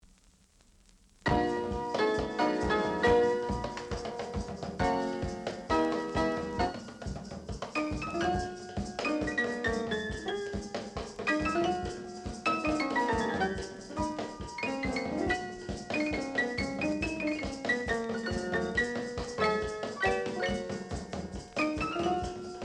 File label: drum ambo